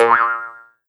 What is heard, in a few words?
Perc Jaws Harp Boing; Suzenako; pitchglitch